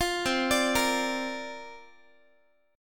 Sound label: C 7th Suspended 2nd Suspended 4th, guitar chord, down; arpeggiated; C7sus2sus4; 20,20,17,20,x,x